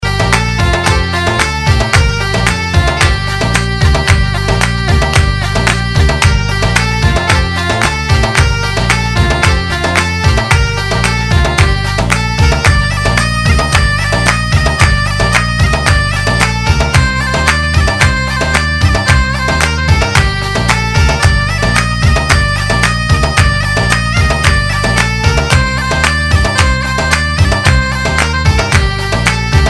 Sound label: Naagan solo musik